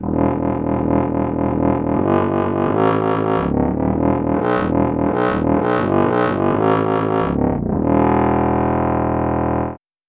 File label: trombone bass